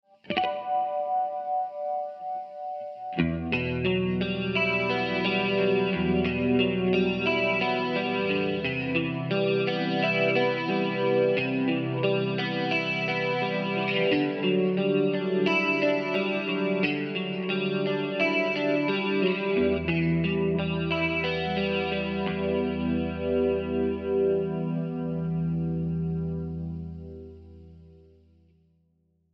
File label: X-VIBE CHORUS; xgear